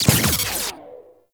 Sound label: Mining Laser Missed Deactivate; Weapons; Warframe; Eidolon Mining Lasers